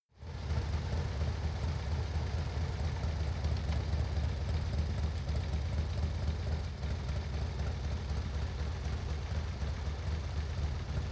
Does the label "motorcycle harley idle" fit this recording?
Yes